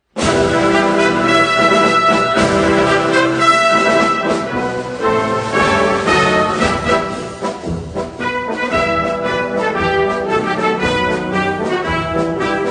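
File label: Kazakh Anthem Instrumental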